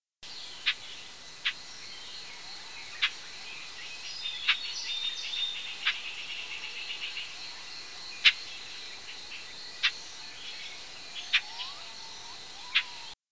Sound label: Siberian Rubythroat